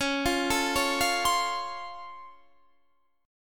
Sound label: Db Augmented Major 7th, down, Guitar, Standard; arpeggiated; JGuitar